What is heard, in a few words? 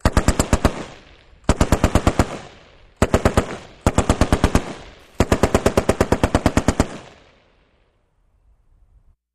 Machine Gun Rapid Burst; bursts